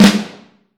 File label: Snare Drum; Q Up Arts; Johnny.C's Vintage Snare Drum; 6X14